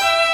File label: violin; osu